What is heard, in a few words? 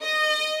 violin